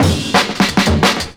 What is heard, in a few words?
Breaks 175, Drum&Bass; EZRollers